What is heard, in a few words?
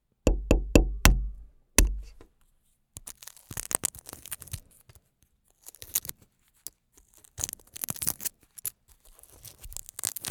boiled egg